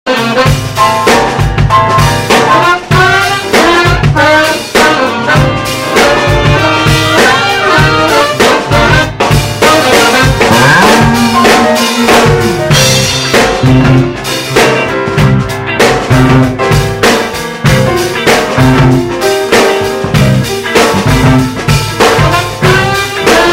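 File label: Fender P Bass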